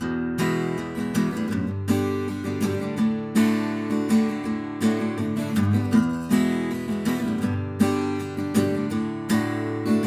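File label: Acoustic Guitar Stereo KM84 U87; MJE-K47H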